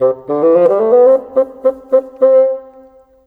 Bassoon, Cinematic

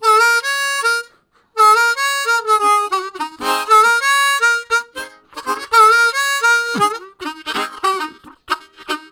Spike, Harmonica Riffs; Zero G

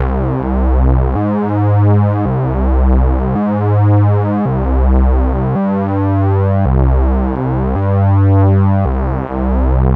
PWM, HARDCORE; CMP - Analogon

